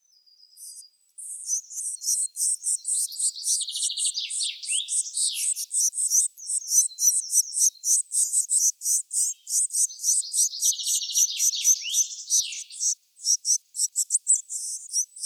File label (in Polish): modraszka zwyczajna Cyanistes caeruleus